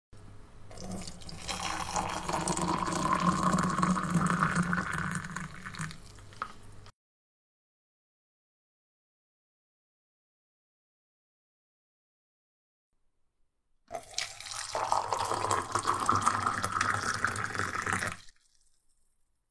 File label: Pouring Tea or Coffee Sound; kitchen